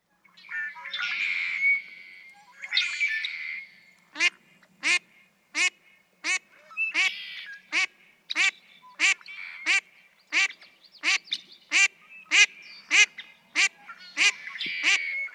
Mallard, Female QUACKing persistently for minutes on end.; March 18, 2017. Harmony Headlands State Park, Cayucos, California.